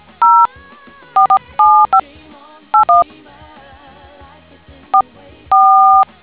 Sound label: dtmf